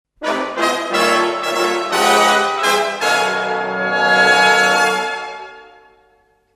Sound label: Dramatic Impact; Ren & Stimpy - Production Music